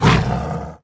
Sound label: panther horn yell, monster